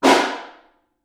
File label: Far, Snare